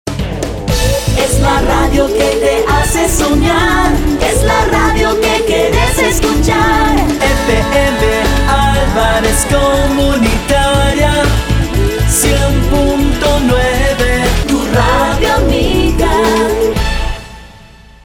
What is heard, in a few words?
JINGLE